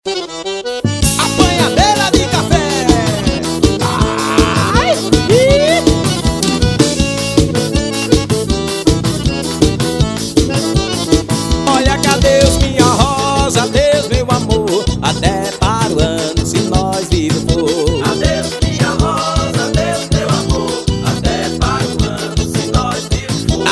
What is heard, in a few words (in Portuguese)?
forro